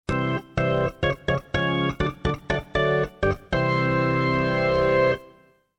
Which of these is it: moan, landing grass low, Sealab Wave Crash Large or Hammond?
Hammond